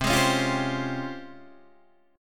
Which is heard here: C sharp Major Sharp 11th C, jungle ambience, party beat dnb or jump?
C sharp Major Sharp 11th C